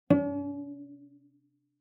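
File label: Cello pizz fortissimo sul D Db4; MIS Pitches - 2014; Strings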